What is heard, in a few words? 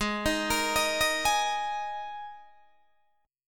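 Ab Suspended 2nd chord, Guitar, Open D tuning; down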